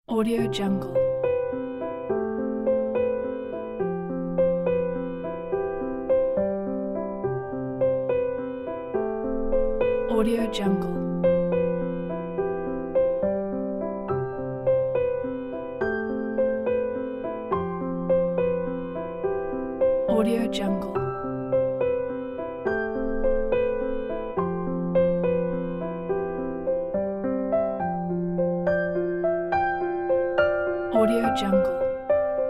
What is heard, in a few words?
beautiful, piano